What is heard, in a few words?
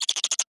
Animal Mammal Herbivore Chipmunk Chatter; animals; AdobeCC